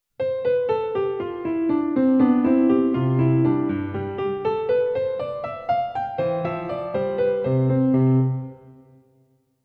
Die Tonleiter

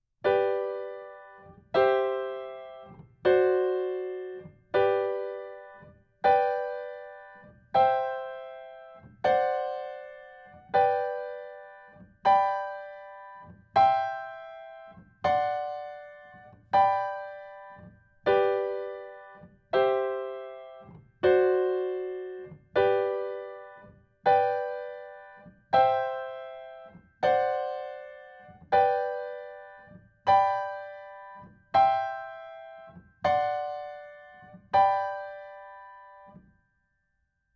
Kadenzen in G Dur Übung

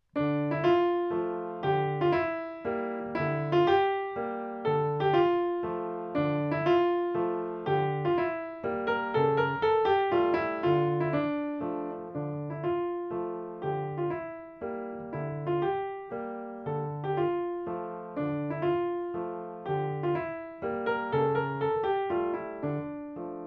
Mazurka

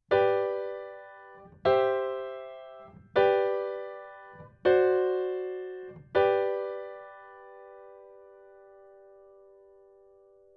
Kadenzen in G Dur Übung

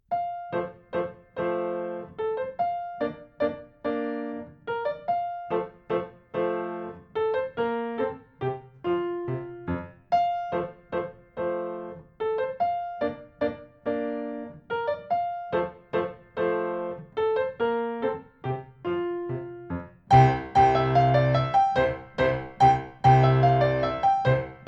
Menuett in F Dur

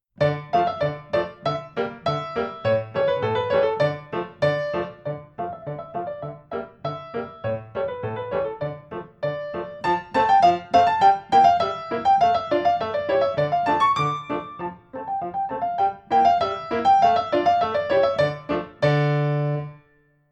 Polka

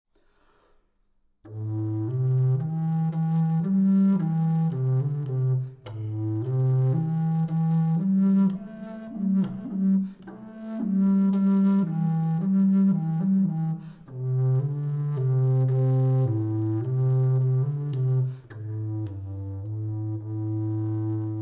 Melancholie Kontrabass; Heller

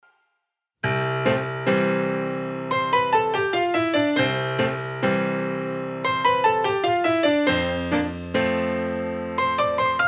Rock That Beat

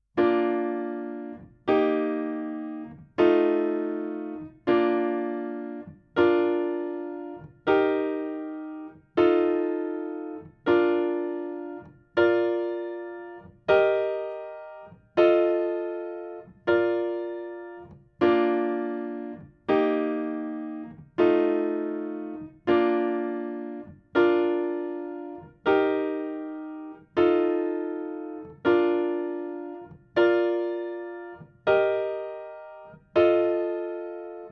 Kadenzen in B Dur Übung

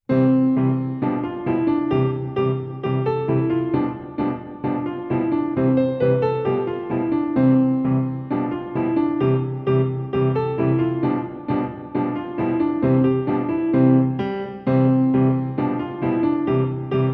Cancan Akkorde in Viertelnoten

